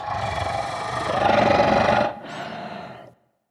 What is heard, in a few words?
sporecarrier hiss vox